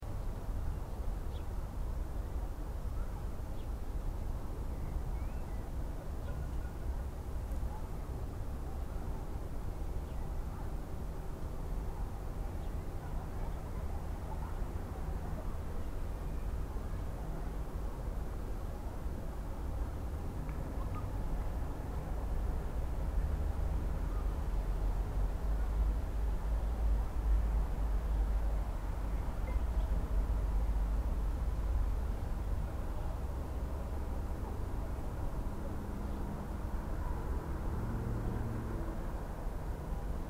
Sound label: atmo; arth